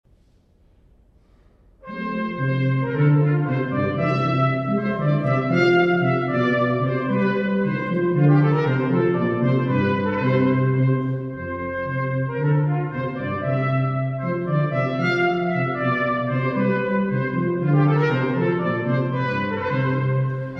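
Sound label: Volte, Brass; Terpsichord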